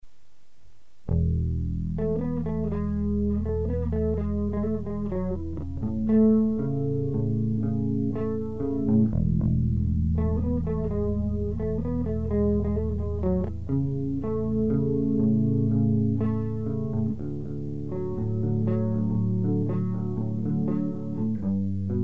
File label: bass doodle